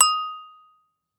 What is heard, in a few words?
message